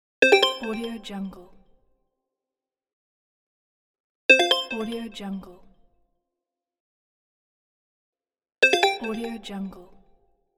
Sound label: system sound